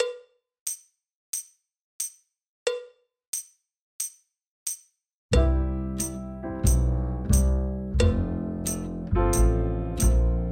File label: Drum Kit Dance Playalong; Grade Three